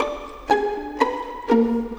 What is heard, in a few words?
Pizzicato, Orchestral Series Rock and Pop; Sonic Foundry (Sony Creative Software); Orchestral Series VOL-4 - Rock and Pop